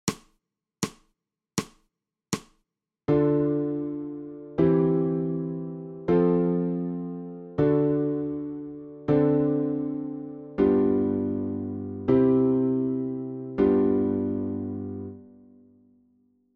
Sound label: ALTO